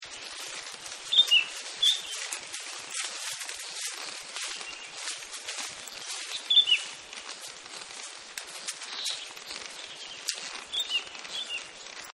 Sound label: Riding A Camel; Camels; Animals